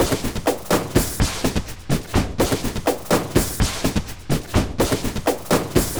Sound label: 5-4 LOOP, DRY; Bolder Sounds - Granular